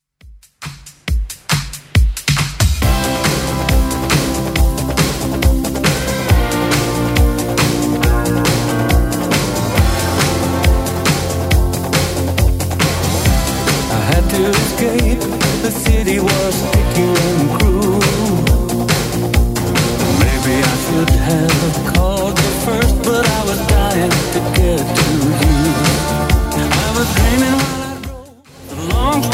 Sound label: 80s Club Edit 138bpm; remixplanet